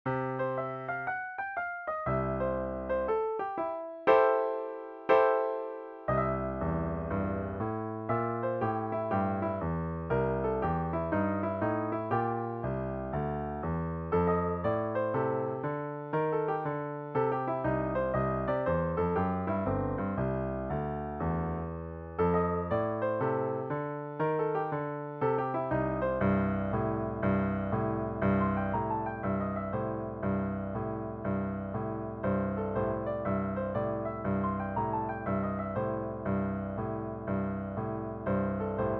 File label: Jazz